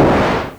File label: Explosion Snare, MultiMoog; Drums